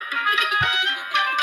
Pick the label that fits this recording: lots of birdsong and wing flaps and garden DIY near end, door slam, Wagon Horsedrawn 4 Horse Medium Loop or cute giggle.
cute giggle